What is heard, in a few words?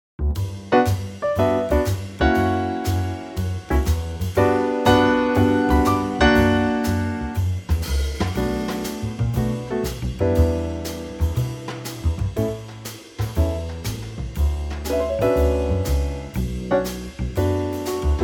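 B minor Trio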